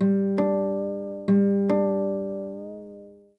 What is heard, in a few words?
harp tuning; dragon riders chronicles of pern 2001 dreamcast gamerip